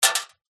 small plastic object drop onto thin metal

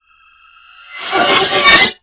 Swell Metallic; bottlerocket

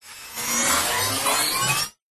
science fiction robot recharge power up fast

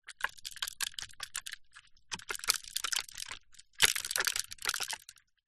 leisure toy game water ring toss handheld shake

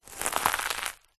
food potato chip crisp crush crunch under foot; zapsplat

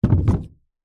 wooden box crate set down hard surface; foley; zapsplat

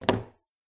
phone drops on wooden table; your free sounds

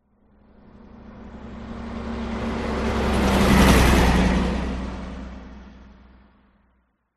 transport truck old small pass by

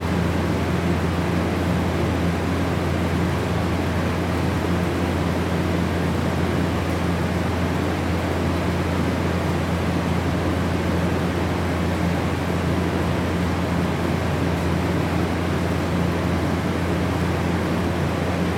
industrial machinery freezer walk in internal; sound effects one

